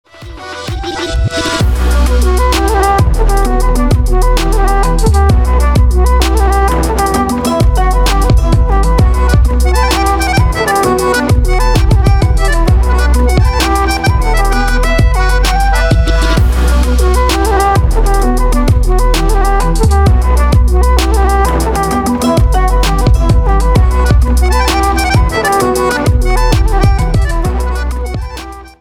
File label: pipe